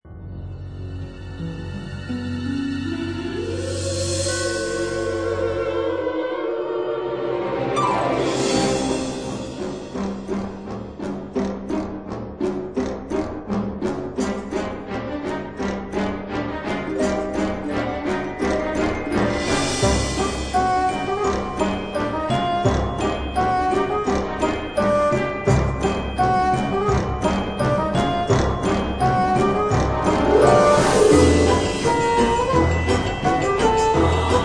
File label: Menu music; Art Is Dead